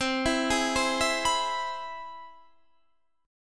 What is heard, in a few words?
C Augmented Major 7th, Guitar, Standard; arpeggiated; JGuitar